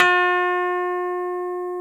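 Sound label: Sup Rhodes E3, Piano Rhodes; Classic Rhds; Keyboards of The 60's and 70's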